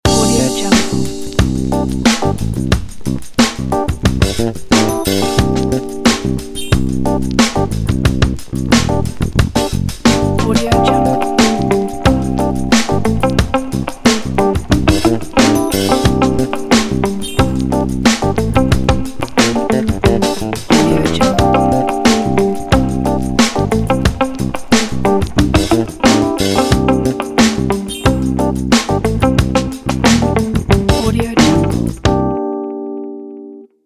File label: funk intro